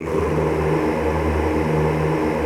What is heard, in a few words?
choir, D1; Best Service; Extended Classical Choir